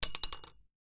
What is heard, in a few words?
cart